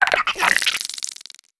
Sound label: idle, headcrab black, creatures; vox